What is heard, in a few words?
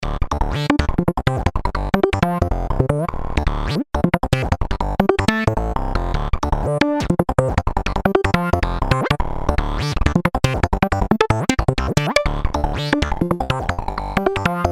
2 osc FM